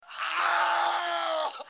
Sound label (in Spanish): grito hombre